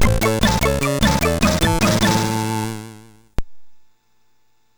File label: sid MOS6581R3; Heavenbound